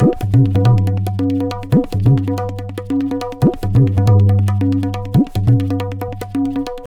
tabla, 140 bpm; Zero-G; Pure Tabla

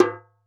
Dholla Tak; Roland BK-5; Percussion; Dholak

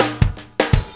Shuffle Fill, Drum Tools

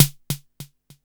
Burning Grunge Hip Hop, RNB 100; USB Soundscan vol.34; AKAI

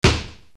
RX7 PUNCH, Yamaha RX-7

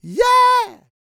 Vocal Planet R&B & Dance; ILIO

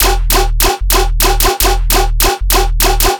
bass loop 150 D#; vikings; Virtual Riot

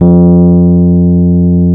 F 0, Electric Pianos; Wizoo Electric Pianos

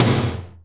TOM, Drum Dry; East Collexion; Drum 1

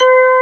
HAM F5, HAMMONDS; East West; Global Sounds

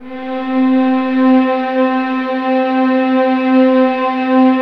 VIOLINS D, Synclavier Strings; ILIO

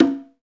PRC XCONGA, Skin Drums

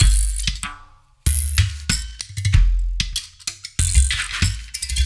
Kongalimba; Sonic Foundry; The Electronic Music Manuscript Collection; Drum Loop Structures

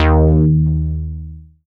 FROG BASS; Masterbits; Dance Trax

